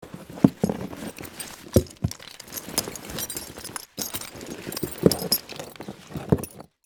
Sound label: Objects office cardboard box handle